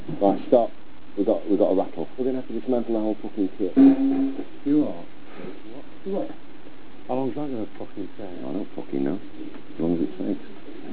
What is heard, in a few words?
dismangle kit mono